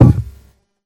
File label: item place